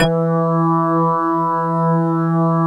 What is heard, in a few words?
METAL BR